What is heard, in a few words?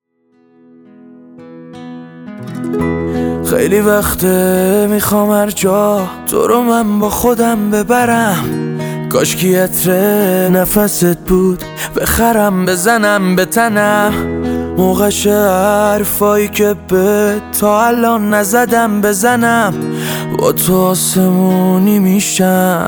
piano version